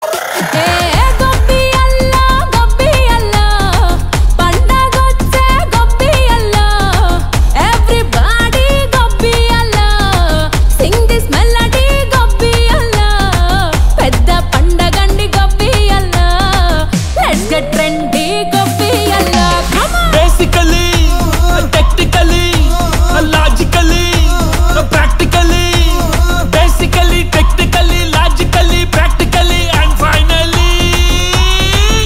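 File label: Telugu song ringtone; PONGAL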